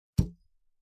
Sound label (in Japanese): コースターにグラスを置く音